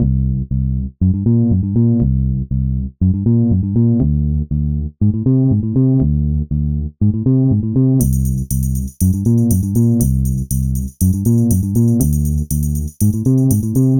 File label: Bass Away